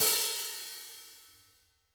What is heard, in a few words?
HTS OP L, Drum Kits